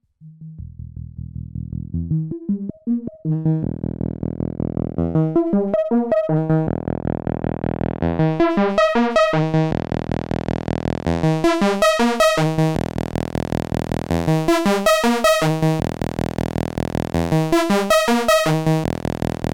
Klangbeispiel Lamda 8p LP

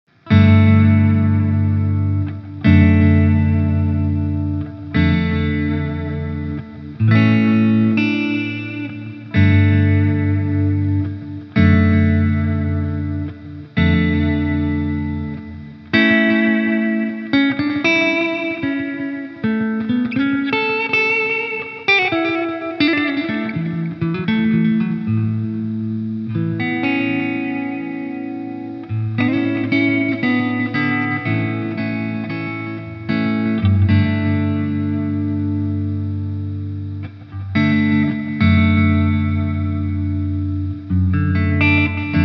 Längeres Delay 450 ms

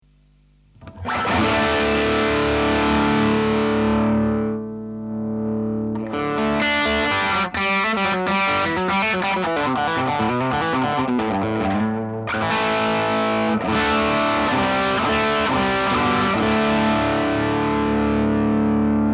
clean up steg halbe zerre

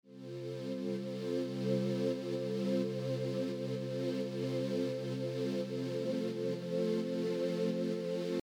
Sidechain Pad Kompressor moduliert Pad via Ghostdrums ohne Drums

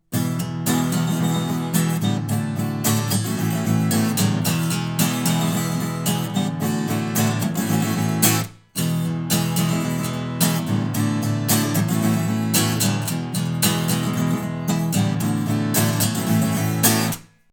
Gitarre Air; LCT-140

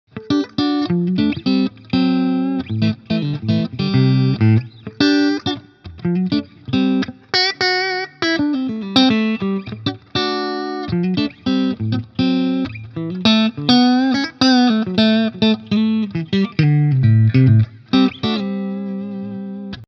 Fender Squier Bullet Strat Hals Mitte clean

